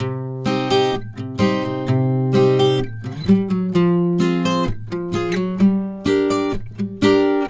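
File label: Acoustic Latin Guitar 128BPM C minor; Acoustic Latin Guitars; Vengeance; Essential Clubsounds Vol.4; Guitarloops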